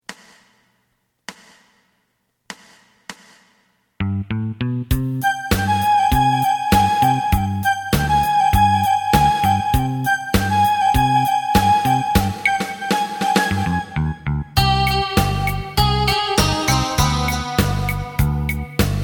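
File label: side guitar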